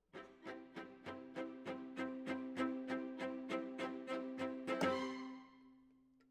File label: Cellos